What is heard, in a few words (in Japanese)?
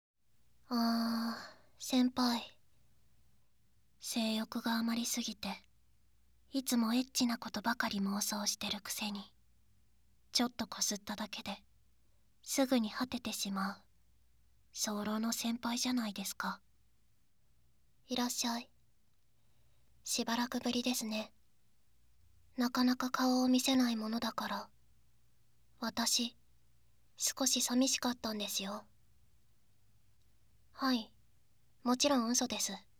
耳舐め手コキ 射精音なし; 耳舐め手コキロング